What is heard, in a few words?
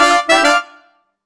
goal scored; Sports & Arcade; freepixel